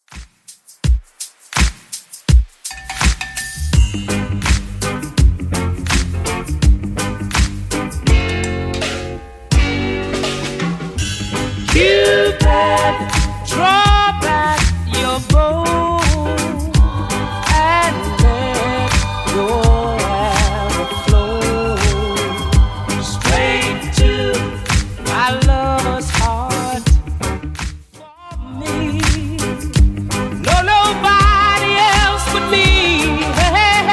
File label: 70s Redrum 83 bpm